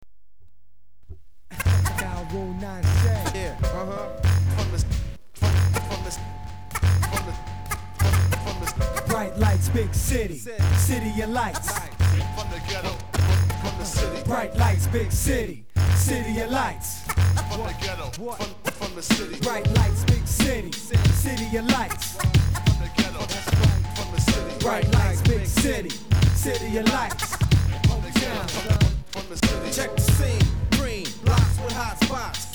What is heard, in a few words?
hiphop